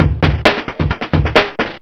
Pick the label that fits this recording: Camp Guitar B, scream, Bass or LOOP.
LOOP